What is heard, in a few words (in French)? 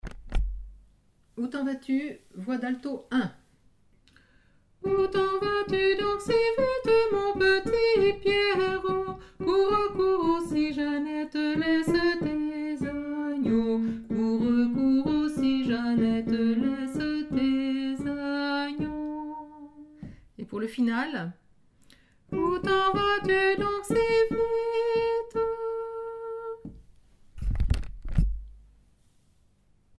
alto; ou ten vas tu